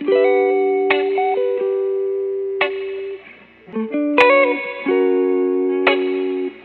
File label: guitar, Loops Phonky